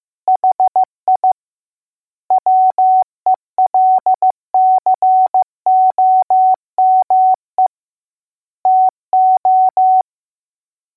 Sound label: welcome cw